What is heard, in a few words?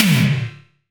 Tom, Gated Reverb; musicradar